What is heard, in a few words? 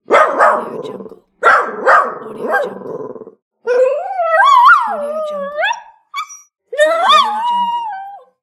dog barking